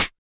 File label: 909 CH; BONUSHATS